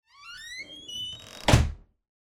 door open

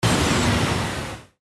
Burst Airy

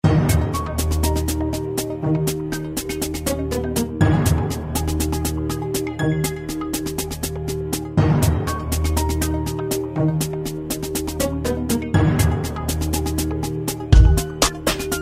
Military Beat

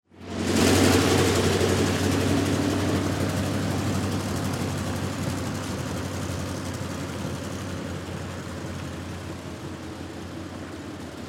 Truck Driving Off

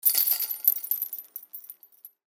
Chains

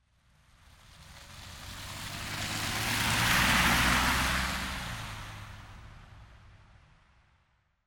Car Rainy Drive By

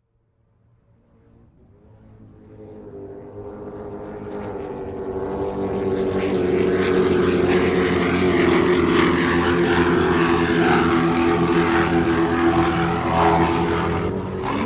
Speedboats up close

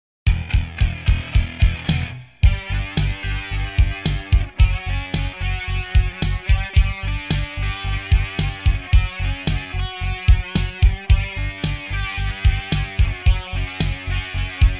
Grunge Lunge; Soundzabound Volume 1 Disc 2

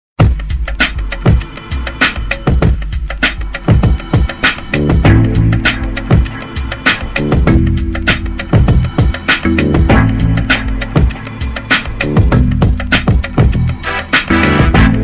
Brassy Keys w Bass & Drums

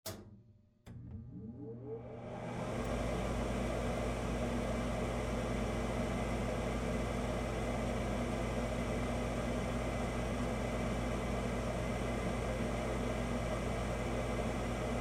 furnace

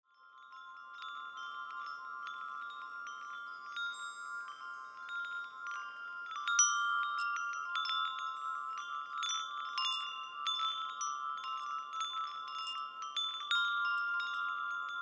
windchimes

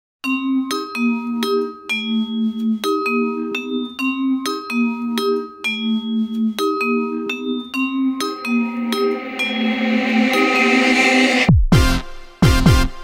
Queen of Pop, no vocals; Soundzabound Volume 5 Disc 2

